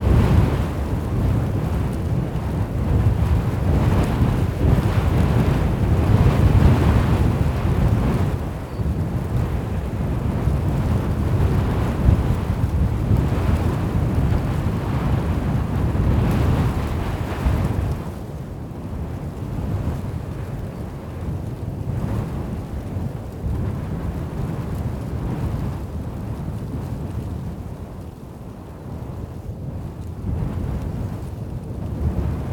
nature wind countryside spring tall grass prairie oklahoma usa; felix blume